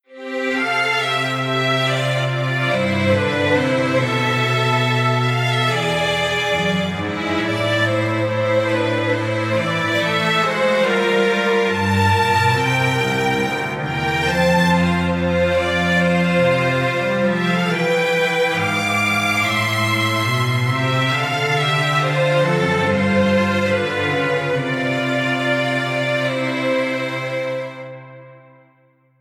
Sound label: Strings Poly; Sample Naisei